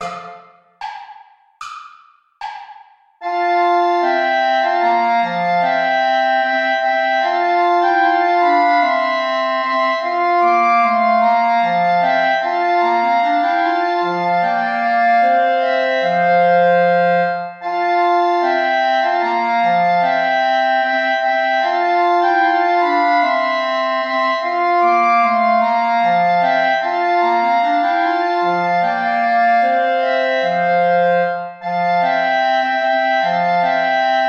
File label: play along without clarinet